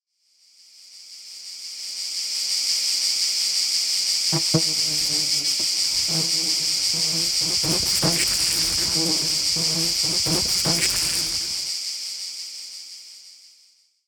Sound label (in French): son insectes